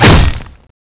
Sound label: axe wall stone, weapons